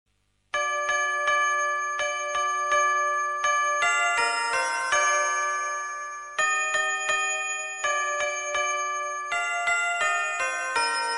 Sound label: Bell Noel, ringetone